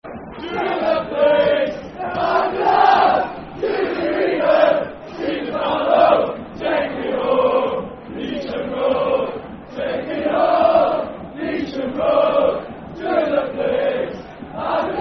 Football Chant (Soccer Songs); Darlington Dfc

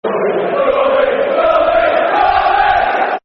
Derby, Derby Football Chants; Derby County Dcfc

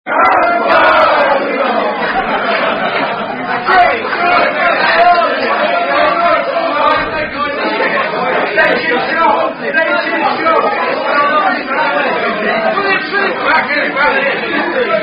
Rugby Social Father Abraham; Naked Rugby Father Abraham

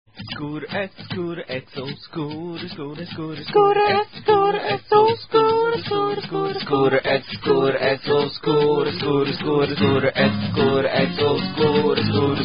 birthday song

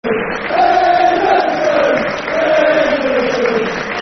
Henderson, Football Chant (Soccer Song); Watford Wfc

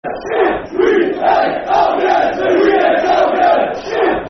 We Hate Albion, Football Chants; Wolves; wolverhampton wanderers; fanchants

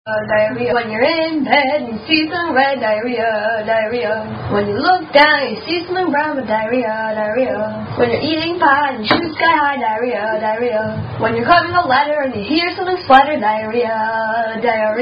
Diarrhea Song

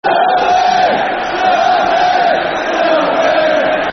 Football Chant (Soccer Songs); Southend; Southend United Sufc

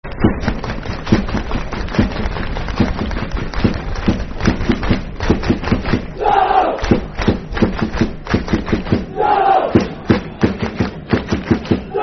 Darlington Clap, Football Chant; Darlington Dfc

